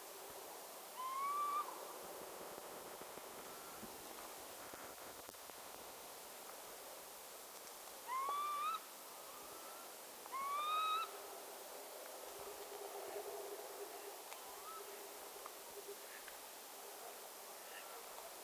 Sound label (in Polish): czajki